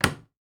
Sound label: Household Light Switch Click On